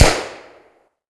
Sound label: smg test